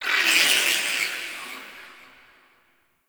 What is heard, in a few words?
Sentient Lair Exterior Creature; Warframe